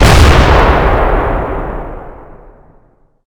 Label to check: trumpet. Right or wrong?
Wrong